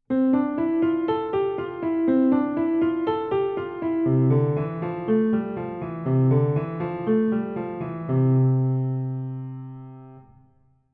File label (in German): Spreizen der Finger Übung